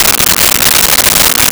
AM Radio Buzz Loop; Communications

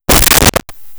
Impact Body Heavy; Impacts; SONY PICTURES SOUND EFFECTS SERIES

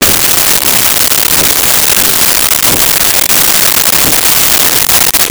Windshield Wipers, Automobiles

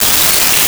Sci Fi Alarm Loop

